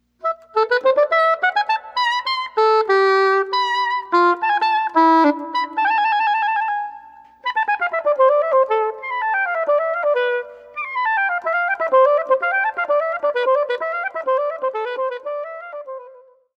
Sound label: flute